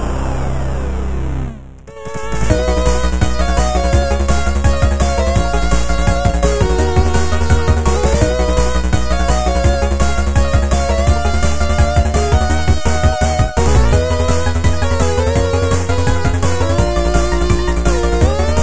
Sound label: chiptunes, Music Beds